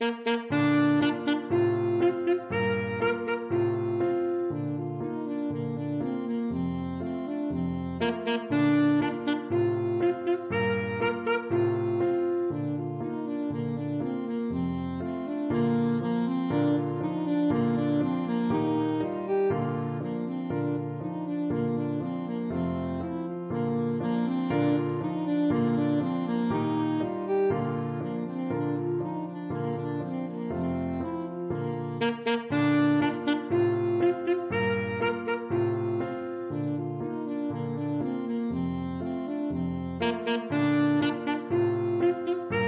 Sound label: ecossaise ASAX, saxophone